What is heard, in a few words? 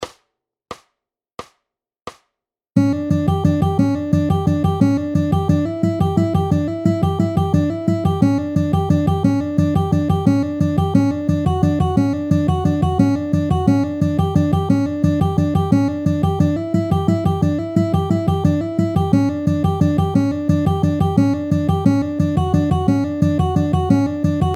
rolls lick